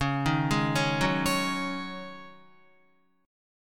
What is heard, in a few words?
C sharp Suspended 2nd Suspended 4th, Guitar; C#sus2sus4 arpeggiated